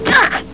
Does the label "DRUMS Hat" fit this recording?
No